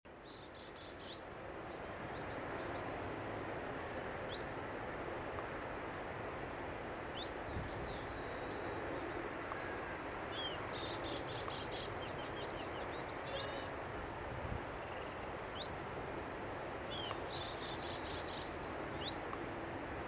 canastero coludo

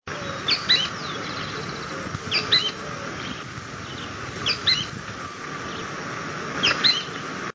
pijuí frente gris

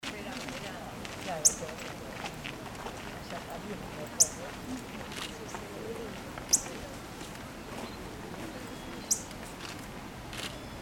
verdón

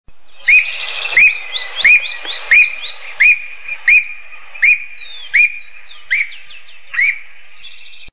voz urraca

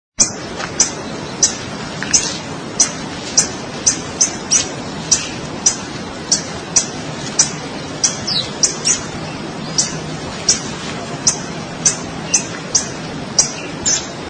verdón, voz

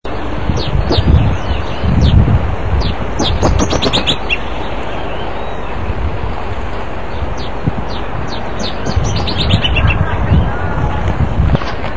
voz arañero cara negra

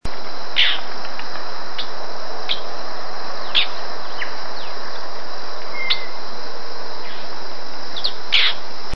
voz boyerito

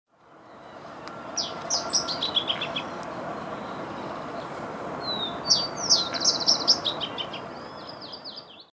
arañero cara negra

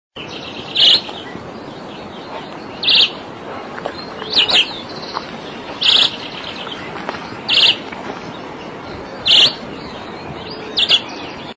voz chivía

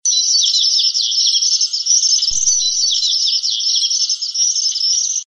voz suirirí real

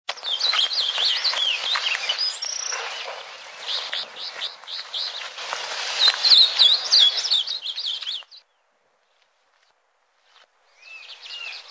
voz reinamora grande con choca

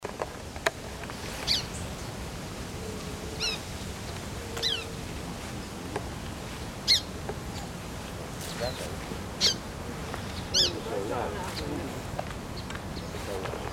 cardenilla

